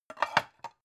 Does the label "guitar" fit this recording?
No